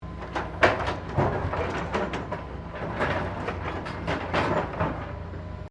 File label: Construction mechanical claw movement; Various construction machinery; SoundEffects Foundation2